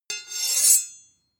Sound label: sword blade grind